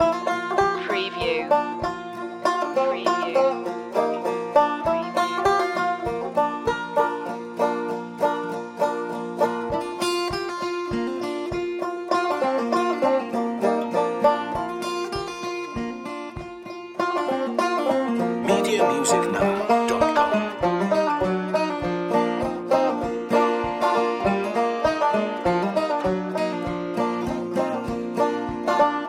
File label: barnwood banjo loop